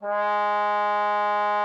trombone G#3; Xsample VOL-3

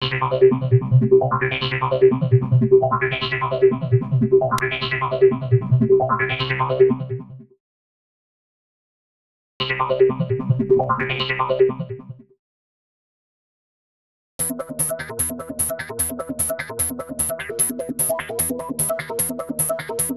Syn+Bass Loops 150bpm; X-Static Goldmine 5

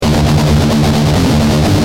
G Jam, Guitar; Industrial

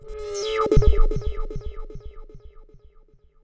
Virus LFO Sweep

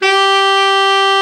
BRA TENOR, SAX; Best Service Gigapack 1; BR SAX 2

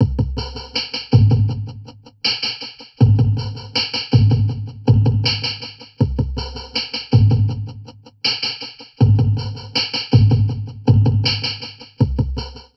Drum Loops Crazy Processed

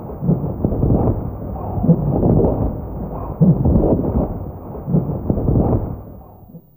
Whirl A2, Fairlight CMI IIe Effects

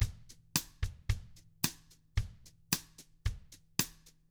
111 COUNTRY; ILIO; Double Platinum Drums 1

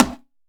HALAM KNOCKS; Best Service; World Colours

